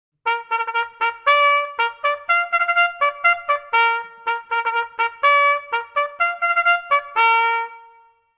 American Military, Recall

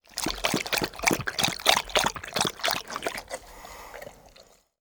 Golden Retriever Drinking Water; Sound Ideas

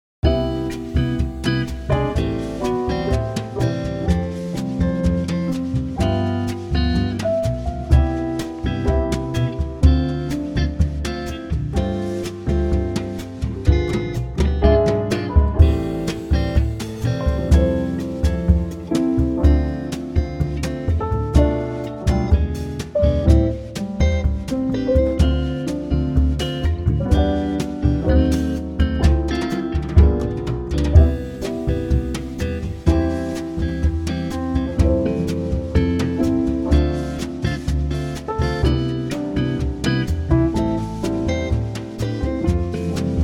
Classic Restaurant Jazz

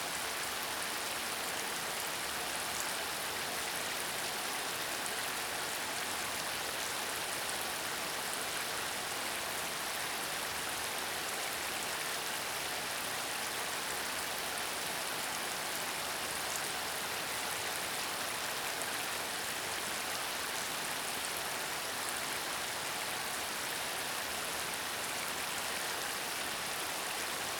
Rain in the Creek; Mother Nature Sound FX

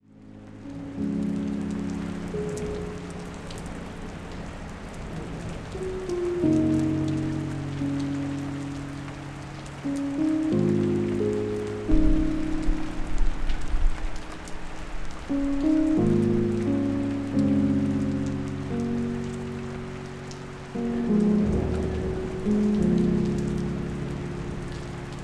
Rain; Pro Sound Effects Library

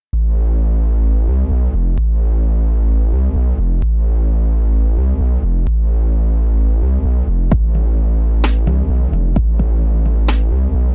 Electro Jingle Start

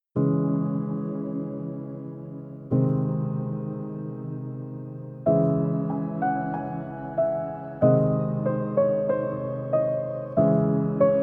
Relaxing Piano Music

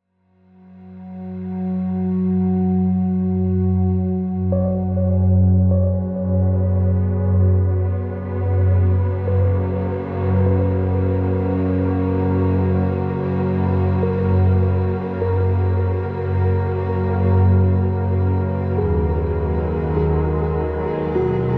741 Hz

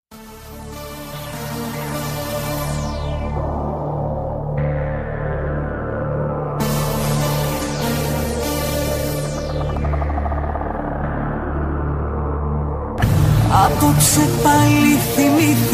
Rington

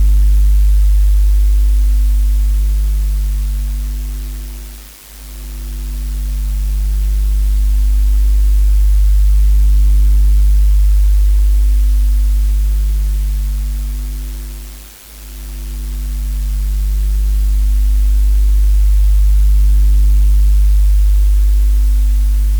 White Noise Delta 50-50.1hz

